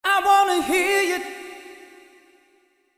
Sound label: Plate Vocal